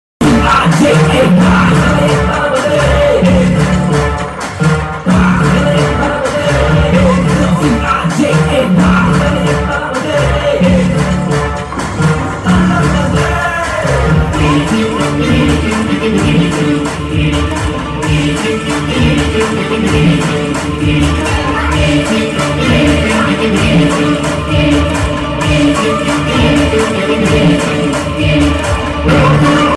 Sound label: interval bgm ringtone; the goat movie; goat movie bgm ringtones; the greatest of all time movie ringtones